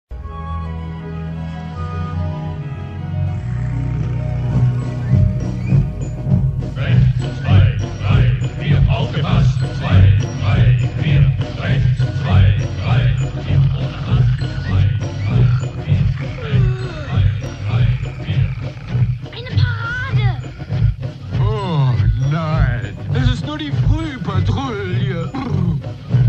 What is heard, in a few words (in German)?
marsch; Oberst Hathi; aus dem Dschungelbuch; bassboosted